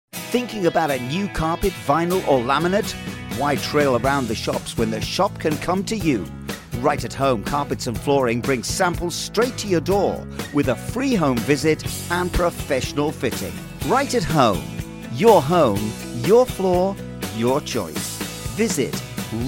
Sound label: Jingle; right at home carpets flooring